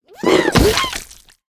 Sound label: Poison